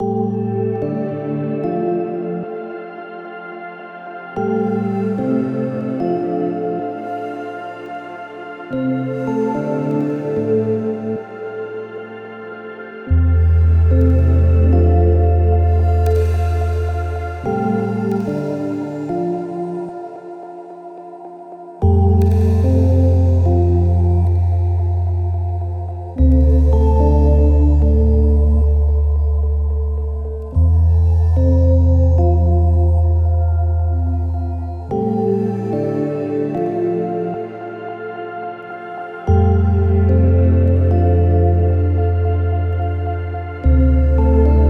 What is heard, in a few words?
Cave; Moonquest